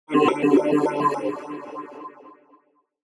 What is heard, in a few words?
level up; breakhack